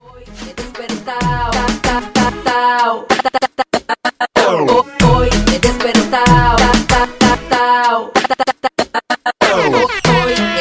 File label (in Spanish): reggaeton mix